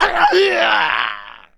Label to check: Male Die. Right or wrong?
Right